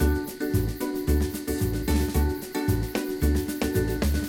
Free Spin Intro Loop; aloha

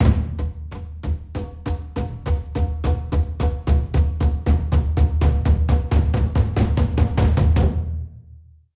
explode drums; serengetikings

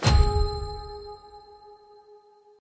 transform Blink; finn

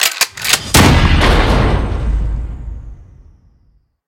big Win Start; dead or alive 2

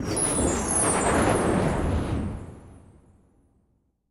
wild spread start; wingsofriches

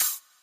steam Short; eggomatic

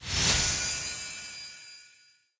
win attention; goldentavern

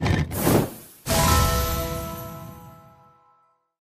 wild Animation; pyramid

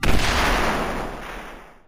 keypad Burst; reel rush

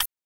button down; football

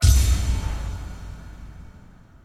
wild Symbol Hit; bloodsuckers2

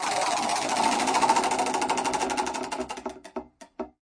chocolate wheel end; koi princess

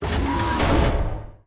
cloner In Out; spacewars

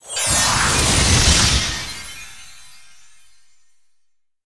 fairy Throw; fairyred

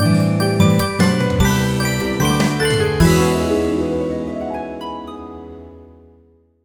outro Free Spin; magic maid cafe

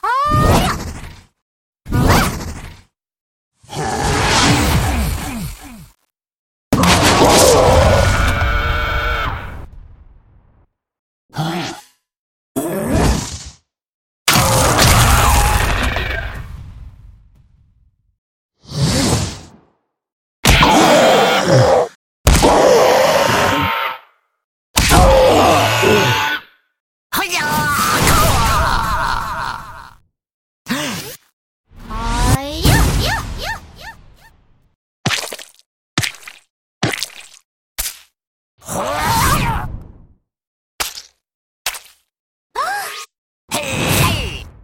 freespin; wildworlds

